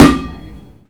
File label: kickball; Sound FX; Crowdsourced Sample Pack